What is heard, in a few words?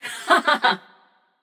yay passion; chaac